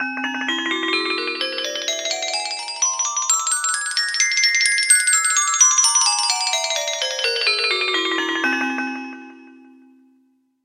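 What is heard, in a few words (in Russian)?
zvuk nachal mechtat, began dreaming sound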